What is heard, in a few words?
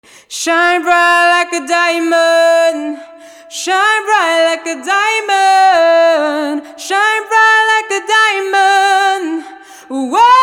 Singing